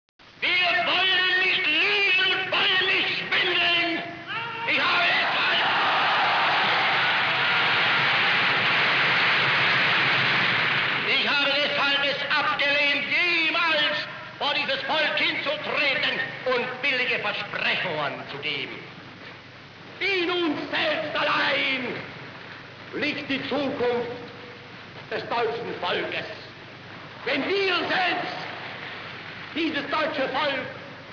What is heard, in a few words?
alien voices, ambience; svencoop; cracklife